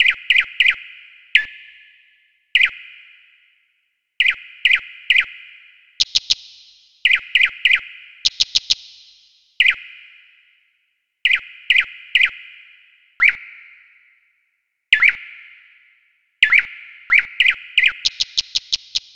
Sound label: Birds Ambience